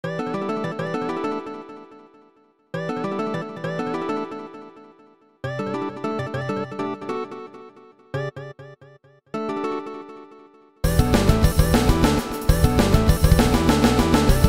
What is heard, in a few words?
No Guitar; DELTARUNE Chapter 5 OST